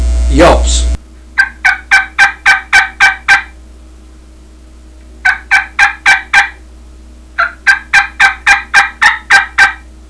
yelps, turkey; ultra mini mag; dixie

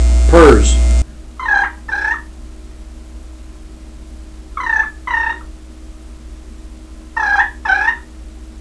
ultra belle purrs, turkey; dixie

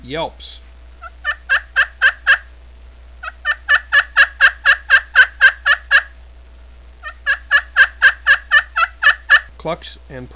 alum; primos

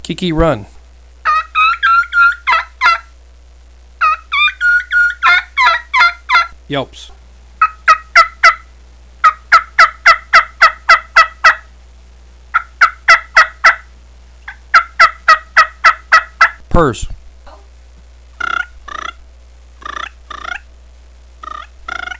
brown kee kee run yelp spurrs, turkey hunting

